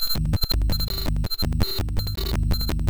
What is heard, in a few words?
buffer fuct loops